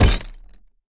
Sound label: fist slam background shakes; goldentavern